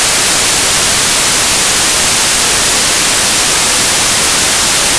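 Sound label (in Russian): white noise; belyy-shum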